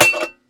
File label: strut Build; Kerbal Space Program; KAS